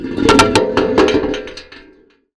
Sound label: Bucket, Marbles, contact mic; musicradar; contact mic madness; Raw